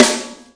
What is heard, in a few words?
tom